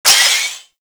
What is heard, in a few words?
Lantern Power Up Short; Warframe; Emissary Season Two